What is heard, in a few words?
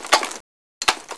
blaster reload